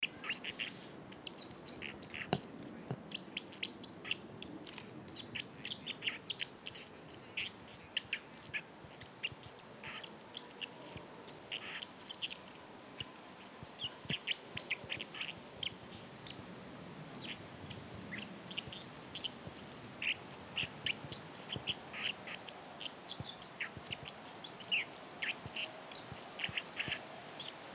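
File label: olyapka golos, dipper voice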